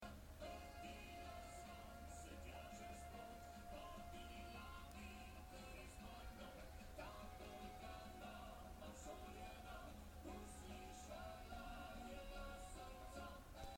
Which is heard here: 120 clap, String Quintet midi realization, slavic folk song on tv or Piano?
slavic folk song on tv